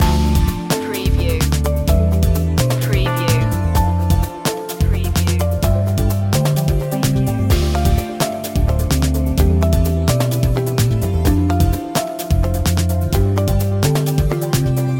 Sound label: broken beats loop, chill